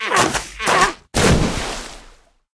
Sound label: Seal